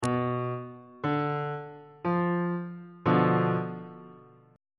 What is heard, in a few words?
c flat diminished chord